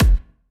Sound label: KICK